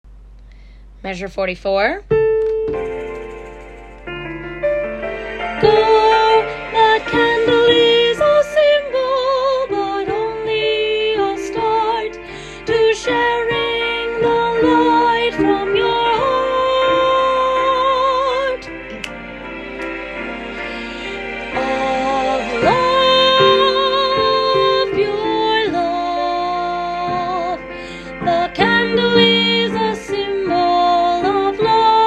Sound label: soprano